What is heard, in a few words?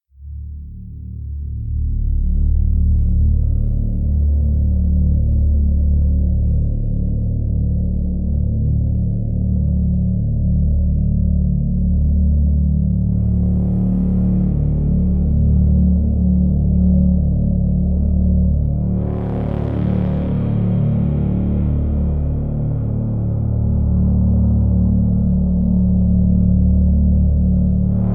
Subtle Noise, psychological dark ambient quiet restless